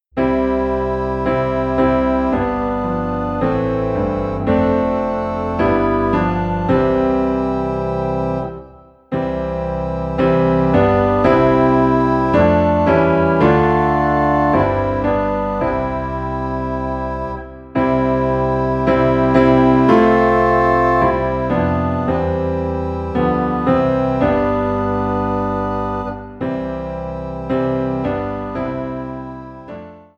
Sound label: Piano and Organ Low in C; When I Survey the Wondrous Cross